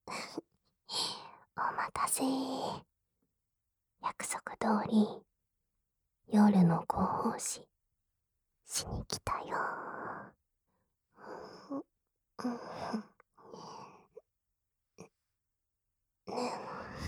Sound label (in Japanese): 耳舐め 添い寝と 耳舐め 騎乗位; ひたすら耳舐めメイド ～とにかく耳元から離れず、密着ネットリ攻め～; SE無し